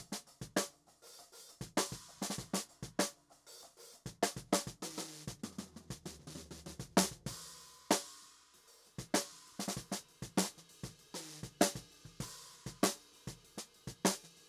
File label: Snare